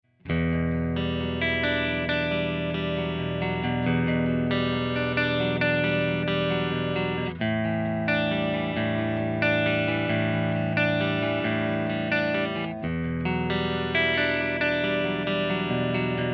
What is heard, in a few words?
Clean; Evil Eddie; Canal clean, mode blackface, sans bright puis avec